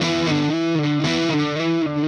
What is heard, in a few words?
Riff Guitar, Indie Rock